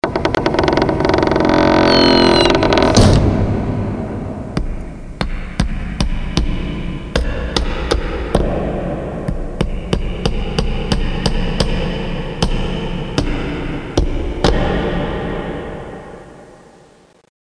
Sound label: Lose Effect